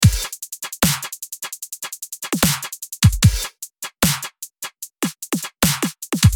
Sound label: Dubstep 150BPM